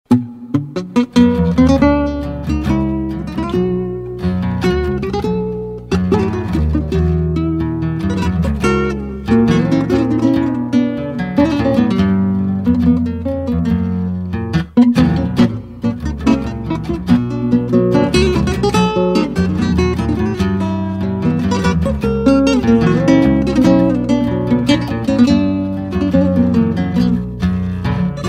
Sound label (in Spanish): Tango